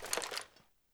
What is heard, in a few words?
ak47 boltpull; weapons